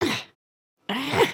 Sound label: adjust hat; emotes